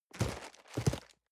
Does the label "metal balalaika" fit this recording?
No